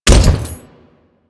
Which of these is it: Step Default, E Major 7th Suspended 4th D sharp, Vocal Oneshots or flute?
Step Default